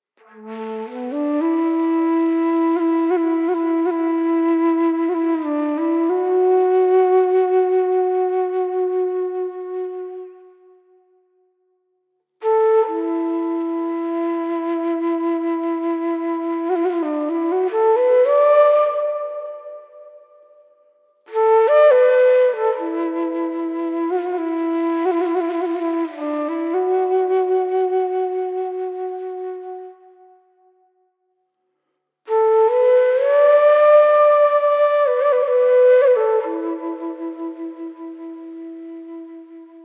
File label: Bansuri